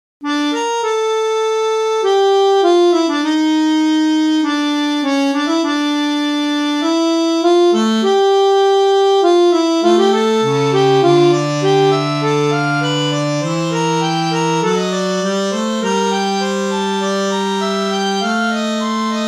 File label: MicrOrgan closed, Reeds